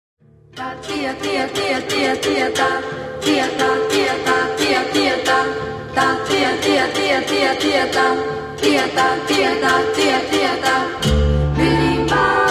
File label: bossa nova berimbau